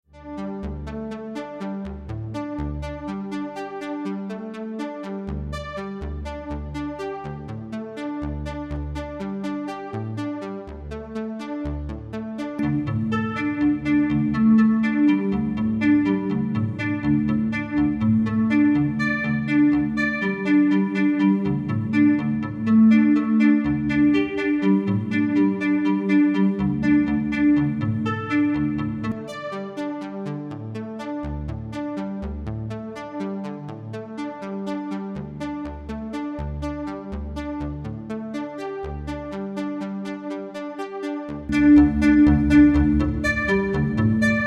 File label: string filter